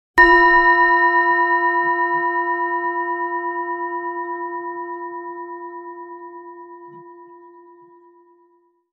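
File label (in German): Planetenschale inkl. Klöppel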